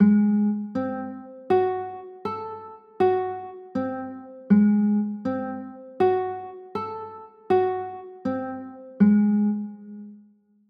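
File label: G sharp 7 b9 chord, guitar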